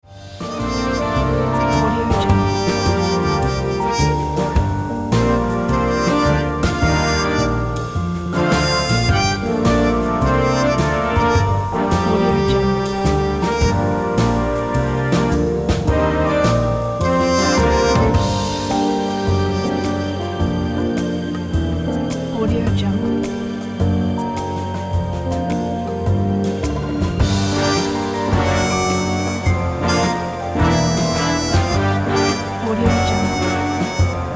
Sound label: jazz lounge